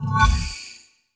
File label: fire Strike; shujinkou